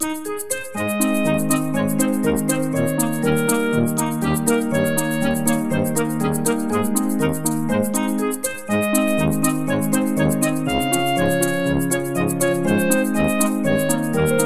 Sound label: Minigame; peppa pig world adventures